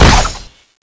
explode, weapons; thanatos5

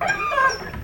door lighthouse checkpoint move; left4dead

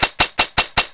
change b; cstrike; weapons; thanatos9

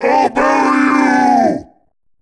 attack; cstrike; db mod 15; omega

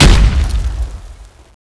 ice club bounce; cstrike; Frozen Terror

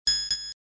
radar; cstrike; zombie plague

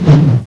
iceclub toss; cstrike; Frozen Terror

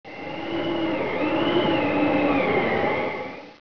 wind; dod; ambience

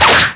knife miss; db mod 15

